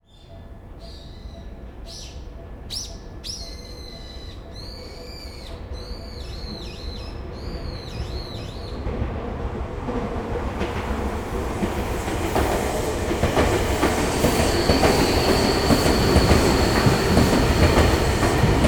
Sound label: train; ZOOM F2